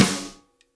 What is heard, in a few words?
ONE SHOTS SNARE, MEDIUM